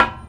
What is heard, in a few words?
Hermann Park Bust, percussion; Bust of Dr Jose Rizal; Houston